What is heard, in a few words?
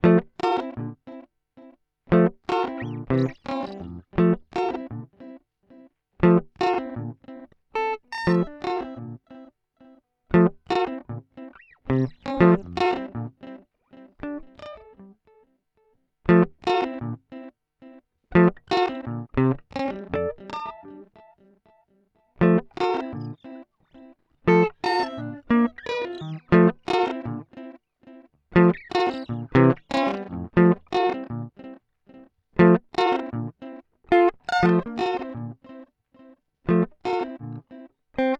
Time Pitch; Amplitube X